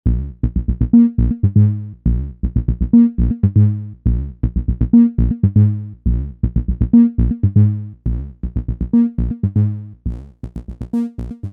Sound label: Torcido Dark Energy Lo Boost